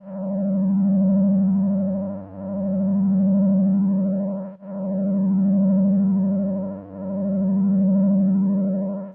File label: anomaly drone; Ambience